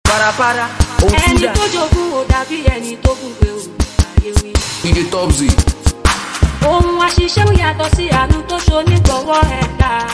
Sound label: Beat